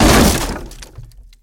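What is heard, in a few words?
kick on a wooden fence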